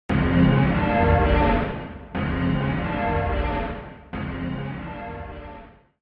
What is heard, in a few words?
music stinger; omori sound replacements; first blood